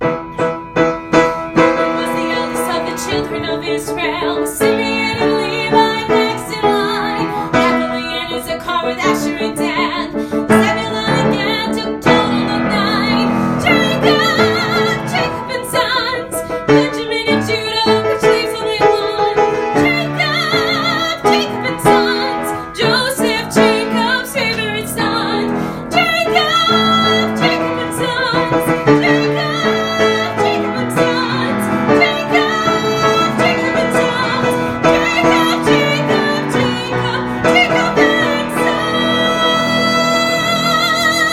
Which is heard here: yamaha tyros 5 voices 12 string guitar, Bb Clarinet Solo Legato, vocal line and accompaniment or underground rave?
vocal line and accompaniment